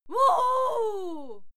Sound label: female cheer wuhu